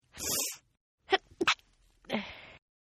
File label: Sneeze Female Stifled; Sneeze Female Stifled 2; Sneezing Sound Effects; Human